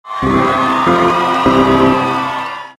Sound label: celebrate